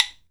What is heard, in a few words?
KALAAW, HAWAII PERC